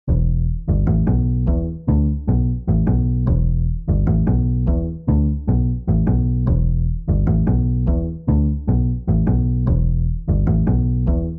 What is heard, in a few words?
bass